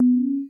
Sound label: Button; xmoto